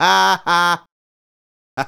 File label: Ha Ha, Hip-Hop and RnB Vocals